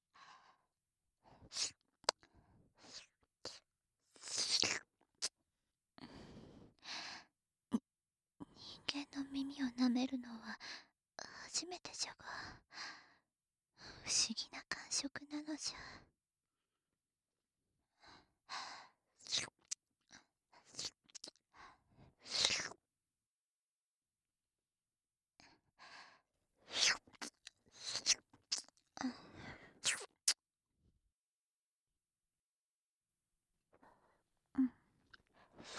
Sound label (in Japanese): 耳舐め